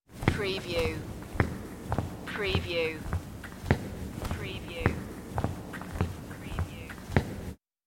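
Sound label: Footsteps Jeans Gym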